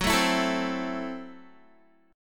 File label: Gb Minor 7th Flat 5th, Guitar; Gbm7b5; strummed; 14,12,14,14,12,x